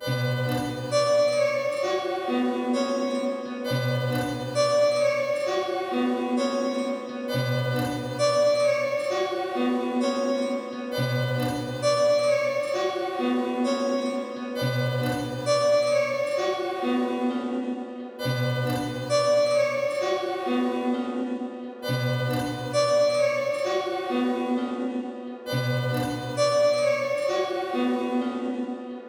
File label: 132 BPM B Min Zither; Melody; Trap; Loop Stems; Cymatics; 2022 Melody Collection - Trap Pt 1; Atlanta